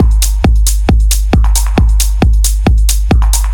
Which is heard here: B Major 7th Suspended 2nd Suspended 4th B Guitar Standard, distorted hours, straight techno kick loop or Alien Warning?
straight techno kick loop